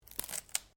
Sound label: Branches Dead Strain; Material components for constructing more complex sounds; Breaking (Sound Effects:Objects)